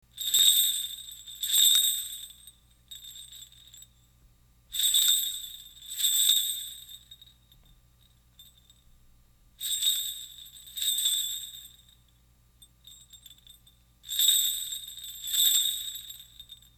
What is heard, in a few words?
kagura